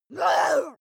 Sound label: witch growl short; kreaturen